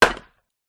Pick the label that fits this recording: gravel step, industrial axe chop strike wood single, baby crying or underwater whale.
industrial axe chop strike wood single